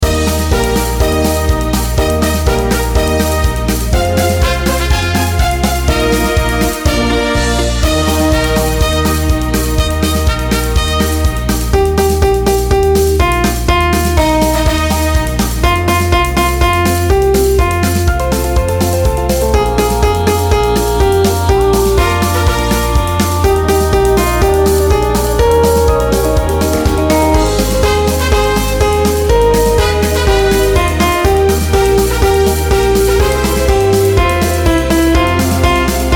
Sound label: karaoke